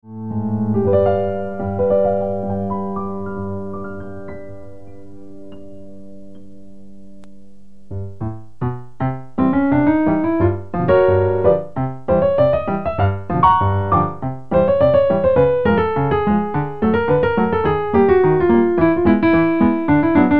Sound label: Romantic Rag